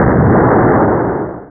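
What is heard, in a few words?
Explosion Long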